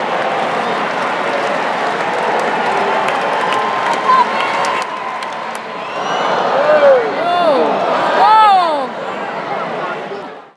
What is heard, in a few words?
Disappointment